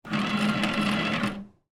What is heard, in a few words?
Paper Towel Run, Office, Paper, Towel, Dispenser, Operate, Public, Bathroom